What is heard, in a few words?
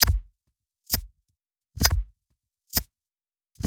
perc clock or lighter looped; Polyend; Recue; percs glitches and layers